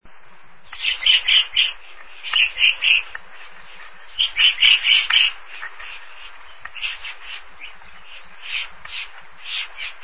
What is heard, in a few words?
Pajaros BIRD